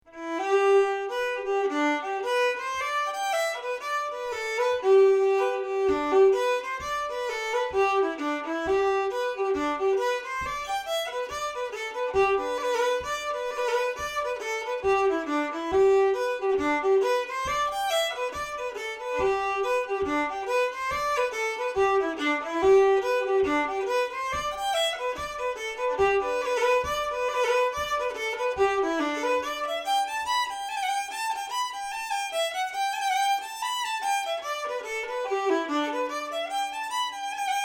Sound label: Duke of Leinster (fiddle)